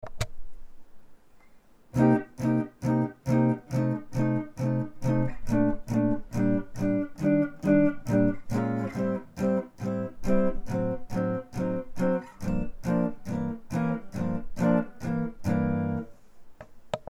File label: bebop chord, guitar